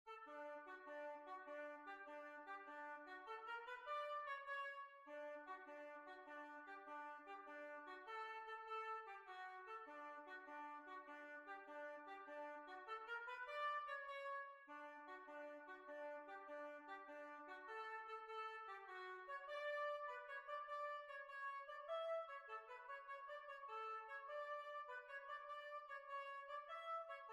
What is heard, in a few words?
Jig; Sally Sloanes Jig